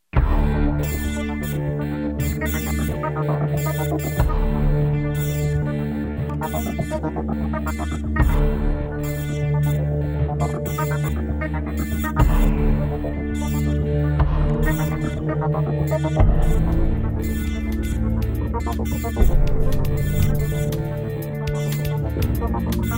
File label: Transitional